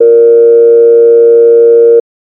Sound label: fm sine